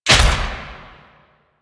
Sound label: Melee Slam Impact; Warframe; Necramech